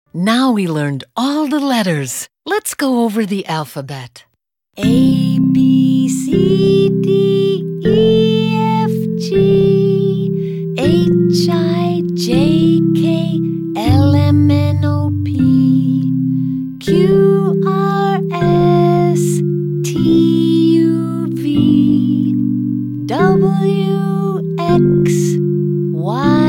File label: abc song with cute ending; tieng anh cho be